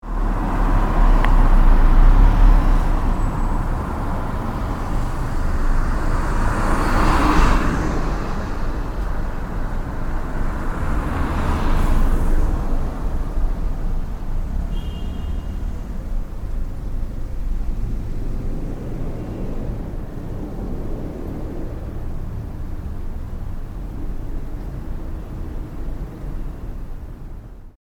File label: night urban road